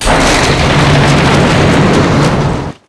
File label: sled thru fence part; hl2 ep2; ambient, levels, outland